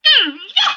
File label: vox attack mmm yoh